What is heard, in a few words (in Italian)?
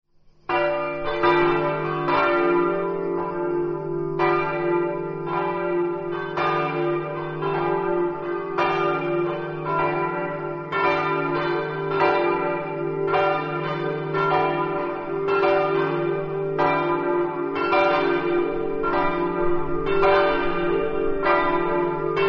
campane, Rosazzo